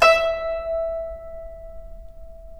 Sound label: GUCHIN E4; INIS - Opium